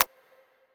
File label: Mouse Click